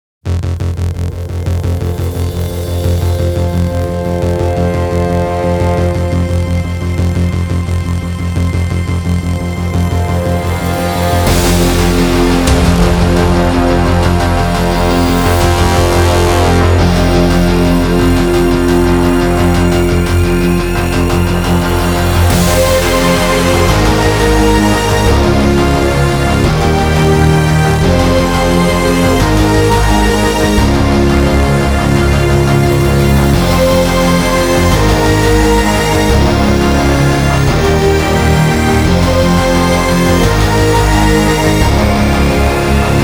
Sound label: Instrumental